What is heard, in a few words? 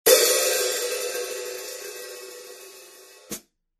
15in New Beat HiHats, cymbal sound